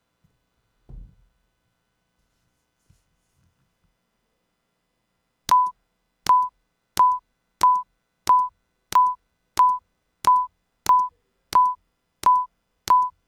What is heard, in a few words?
latency test